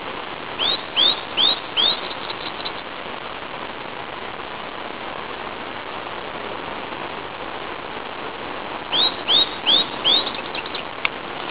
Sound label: bird unknown